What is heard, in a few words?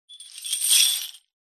industrial chain set down ground